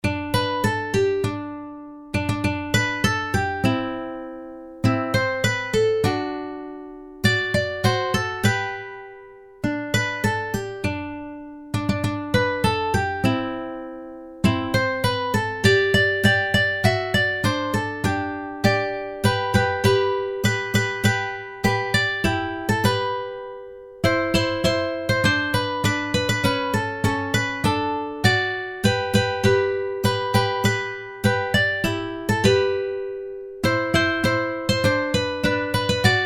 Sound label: Jingle Bells Ukulele G